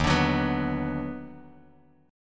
Eb Minor Major 7th Sharp 5th Eb down Bass Standard, chord; EbmM7#5; strummed; JGuitar